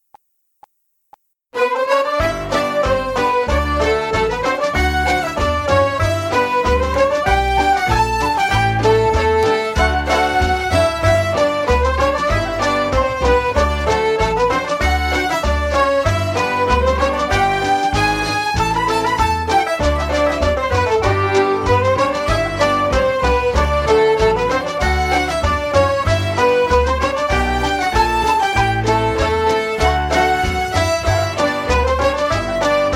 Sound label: Irish Ceili Polka Medley G; backing track